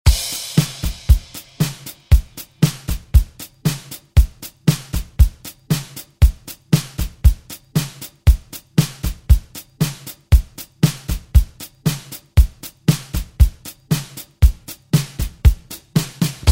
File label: ReDrum; DJ Mhark